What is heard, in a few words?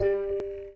new note; fchat-rising